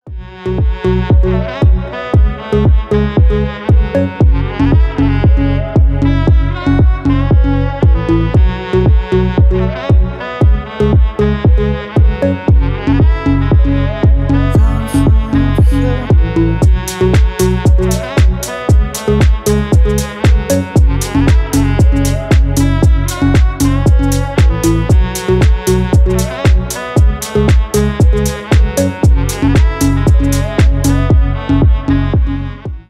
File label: instrumental